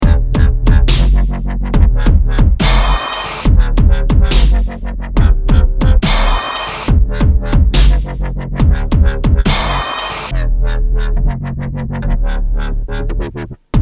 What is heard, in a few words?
dubstep test